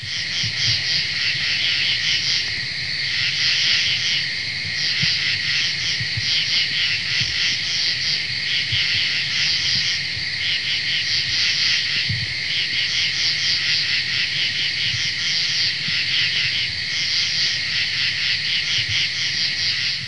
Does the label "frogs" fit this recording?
Yes